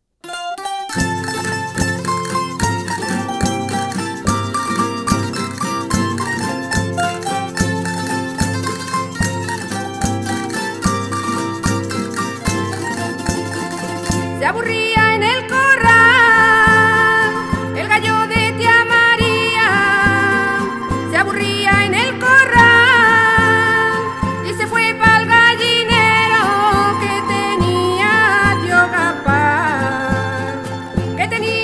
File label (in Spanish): Jota del Gallo; Track10 Jota del Gallo